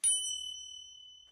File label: btn accept; Dorothy And The Wizard Of Oz - Cookie Magic